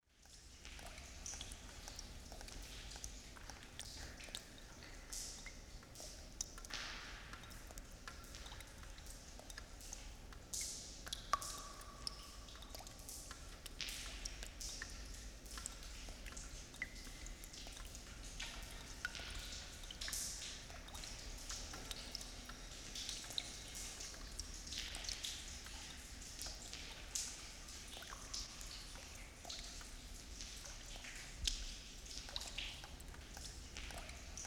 Cave Interior with Dripping Waters

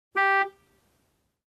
Short car honk

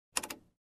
Overhead Bin Locker Click

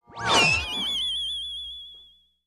Magical Spell

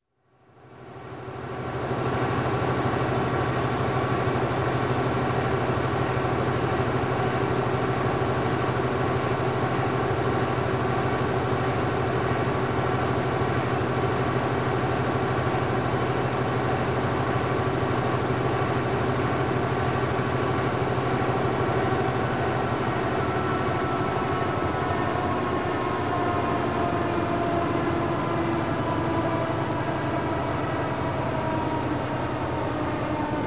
Honing machine running

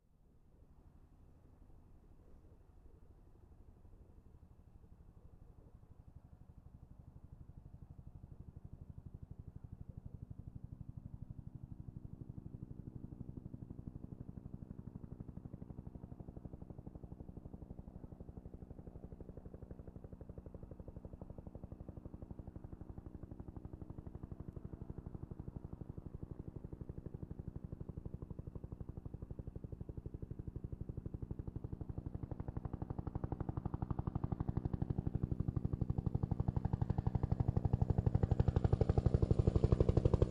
Helicopter flying over

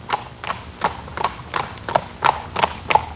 Farm animals trotting

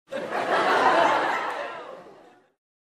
Group of people laughing

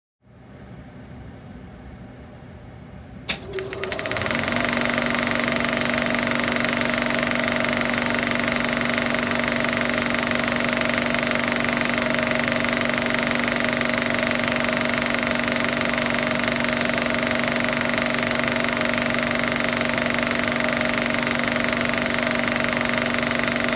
Old Camera Projector Rolling

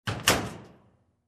Steel Door close

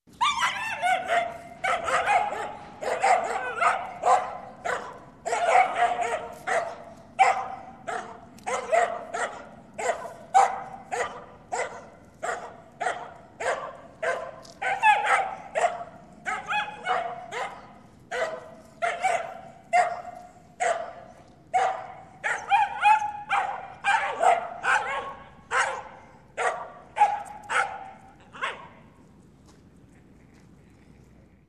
Dogs barking at each other

